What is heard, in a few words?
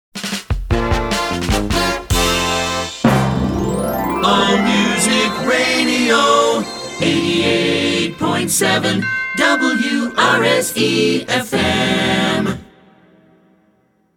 Jingle; Short Attention Jingle Show IV